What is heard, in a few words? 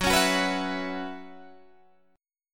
F sharp 7th Suspended 4th, Guitar, Open G